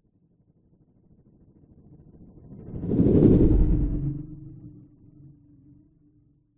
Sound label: science fiction spacecraft fly by fast